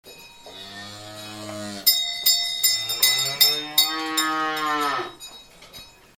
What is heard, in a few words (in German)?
Kuhstall